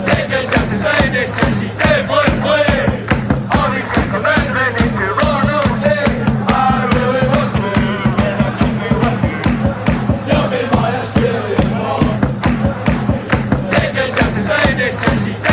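chant, Hearts football chants from Tynecastle Stadium; cameron devlin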